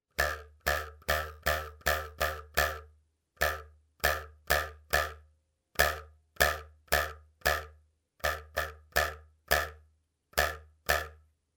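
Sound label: ruler sound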